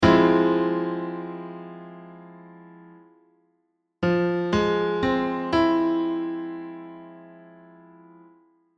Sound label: Fm maj7